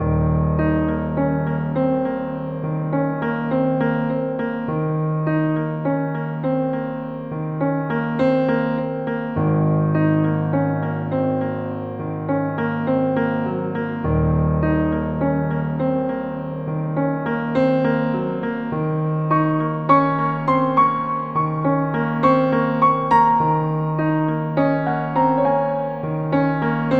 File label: sad piano; 1080 snowboarding; musicalwolfe